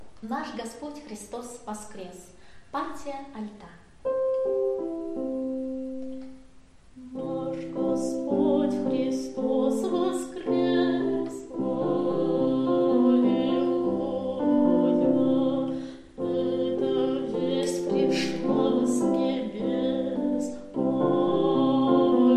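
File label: alto; Nash Gospod Hristos voskres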